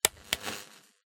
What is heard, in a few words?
Flashlight; Five Nights at Ronald's